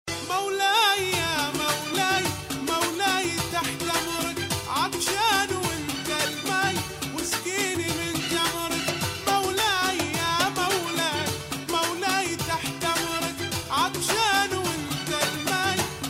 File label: tone; jazz